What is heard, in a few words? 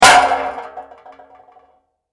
Impact Metal Bang; Materials